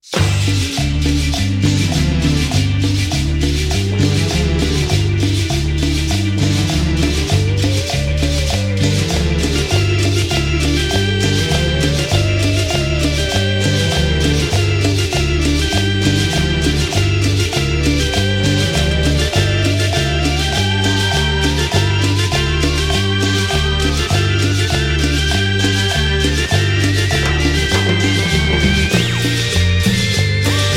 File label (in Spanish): Cumbia